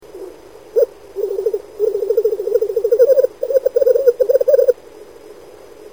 zvuk izdavaemyj teterevom

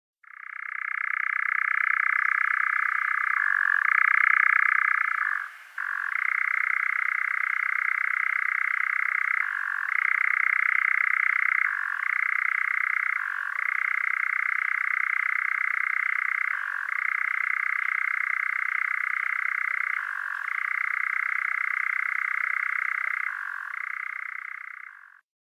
zvuk penija samca kozodoja

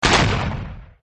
выстрел танка

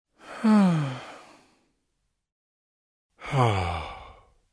muzhskoj vzdoh

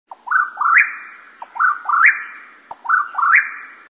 korotkij krik kozodoja

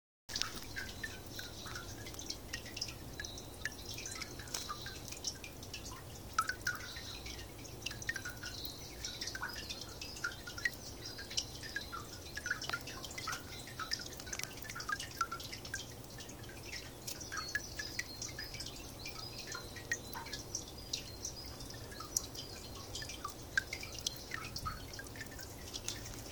kapel v lesu

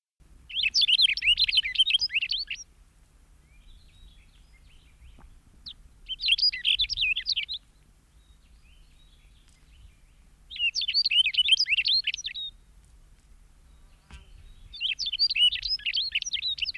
penie ovsjanki remeza

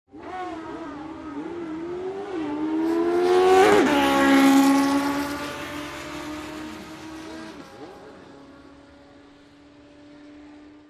zvuk gonochnogo motocikla

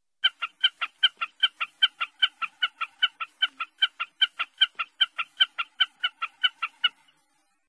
utrennie kriki bekasa

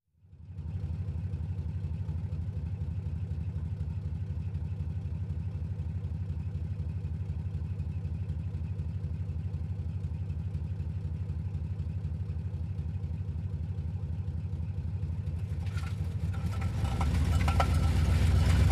avtomobil s otorvannym glushitelem

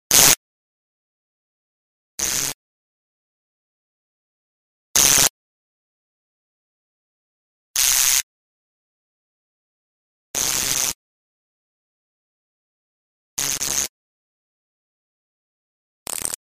zvuki razrjadov vysokogo naprjazhenija

